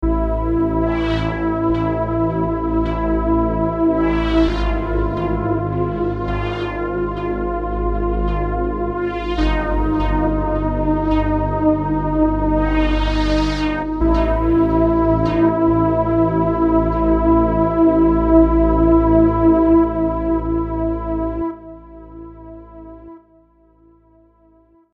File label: They Play Together